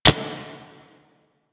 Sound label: Sticky Bomb Idle Catch; Grineer; Veilbreaker; Vor